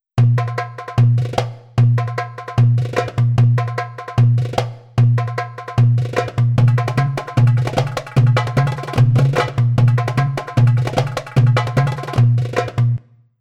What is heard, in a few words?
Derbuka